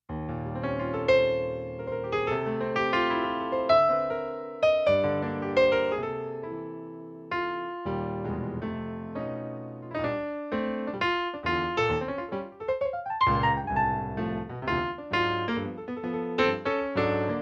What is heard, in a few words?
piano